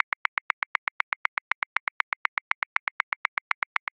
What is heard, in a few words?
House Subtle Swung 16ths; Grooves; Ableton Live 12 Lite; Core Library